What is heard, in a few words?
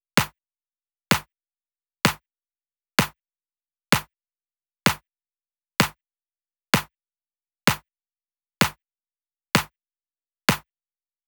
Loop 128BPM Clap; VEC5 Drumloops; VEC5 Multi Loops 128BPM; Loop Elements